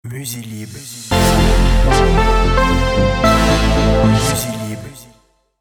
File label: evolution, jingles